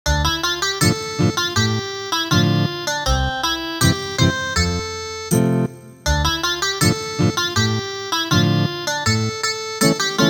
Ethnic Guitar

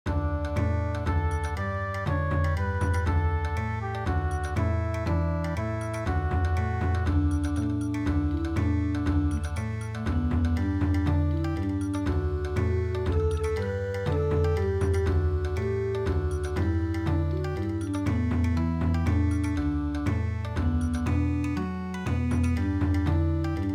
Bon Odori

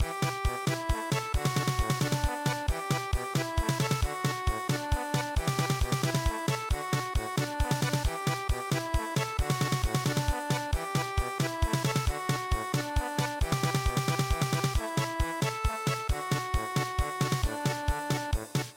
German Polka; Bye Baby Bunting

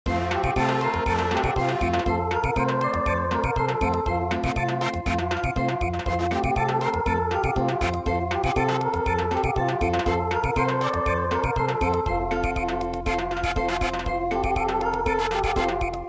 Samba; frog song

